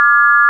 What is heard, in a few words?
sine; ものづくり基礎工学（情報工学分野）; 音の波形と分析